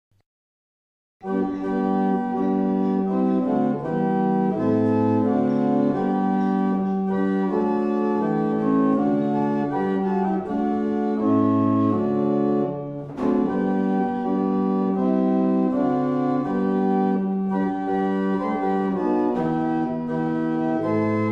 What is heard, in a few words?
Worcester, barrel organs; Mattishall